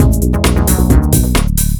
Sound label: 2 Step 133 MIX, House